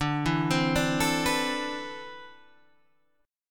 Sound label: D 13th Suspended 2nd D